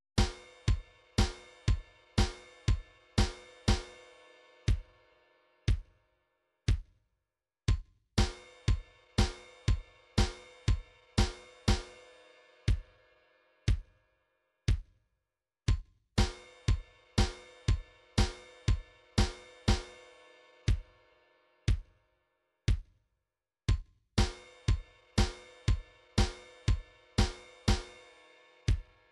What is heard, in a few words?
drums, midi drums